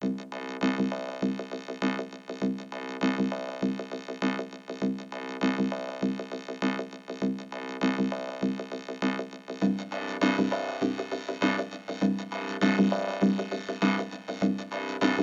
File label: vocoder drums ensemble fx